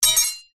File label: foley metal flat short thin length drop ground; zapsplat